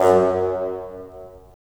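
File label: wind bassoon; LA Composer vol. 3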